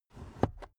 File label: car headrest full adjustment